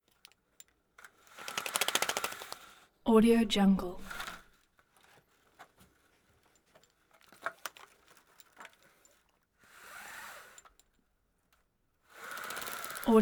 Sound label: venetian blinds